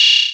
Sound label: Open Hat